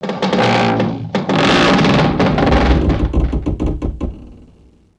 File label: Creaky Bridge